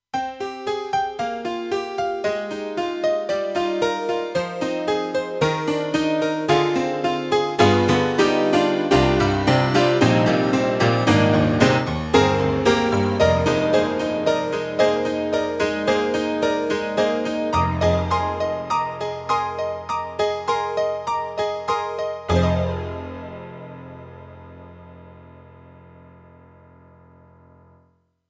YAMAHA TG300 GM-B Hnkt Tnk

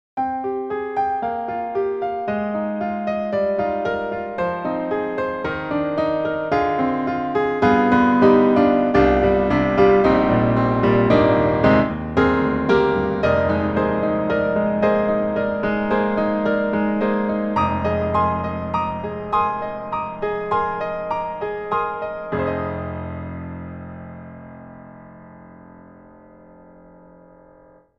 Grand Pianos Bosendorfer Ostinato Bosendorfer; Synthogy; IvoryII

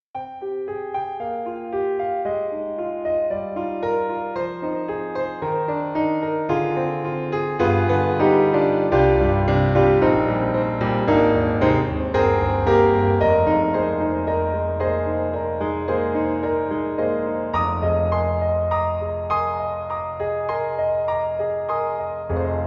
Mini Grand Concert Hall Back Row; AIR Music Technology

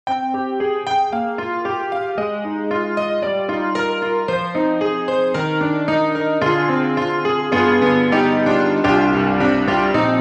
Grand Rhapsody Piano, Chorus for the Verse; Waves

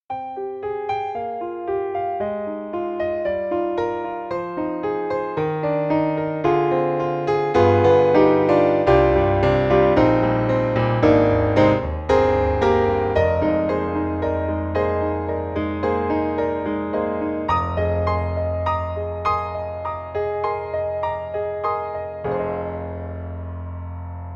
Mini Grand Real Piano; AIR Music Technology

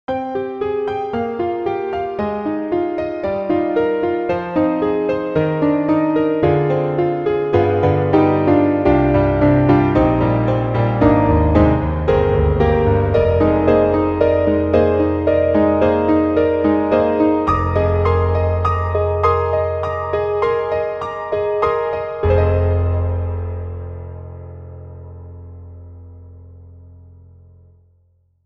Grand Piano; reFX; Nexus2

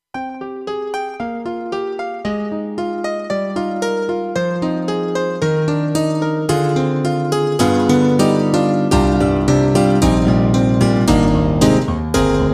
KORG M3 XPanded M1 Piano w Delay

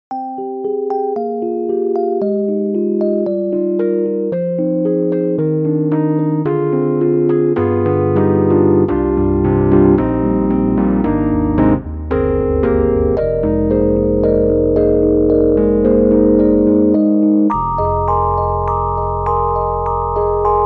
stage electric piano; IK Multimedia; SampleTank3

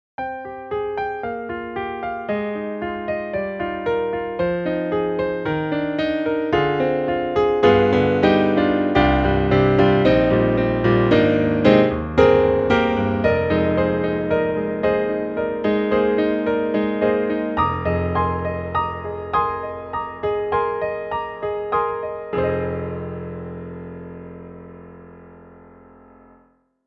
Grand Piano; Steinberg; HALion 3; Grand Piano 2 XXL

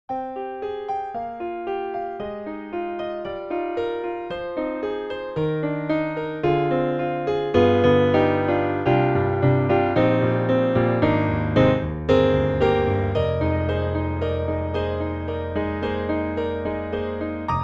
Grand Piano; SoundFont2; Salamander Grand Piano v3

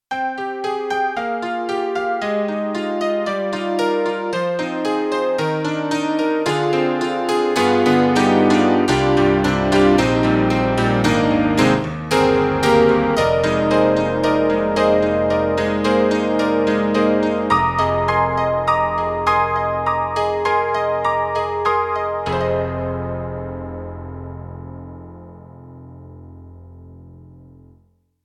Roland SC-88ST Pro Honky tonk

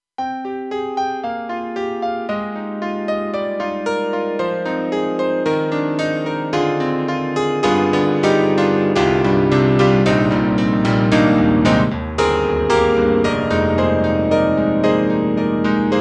KORG M3 XPanded CP-8080

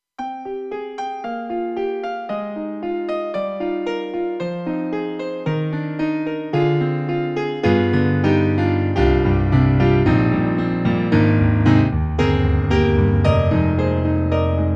Nu'Coustic Grand; KORG EXB-PCM08; TRITON-Rack

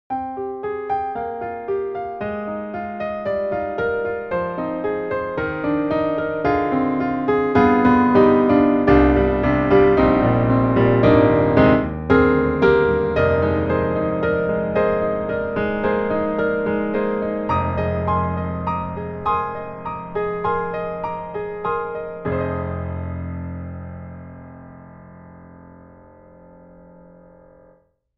Grand Pianos, Bosendorfer, Warm Bosendorfer Room; Synthogy; IvoryII

